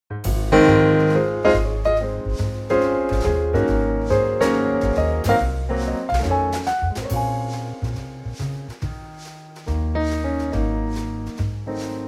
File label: swing; key - Db; Trio arrangement